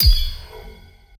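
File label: Design misc Kill Confirm Metallic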